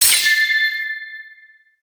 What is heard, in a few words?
light mag